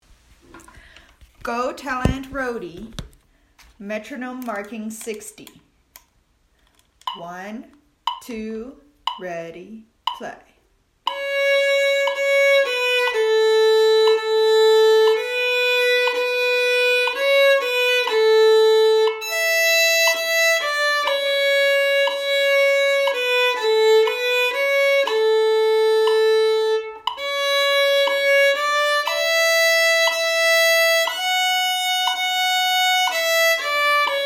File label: Go Tell Aunt Rhody Met 60